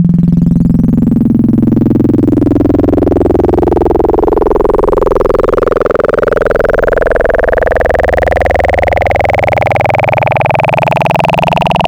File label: Sine Formant II, Wavetables; Cymatics; Wavetables Collection Vol 3; Serum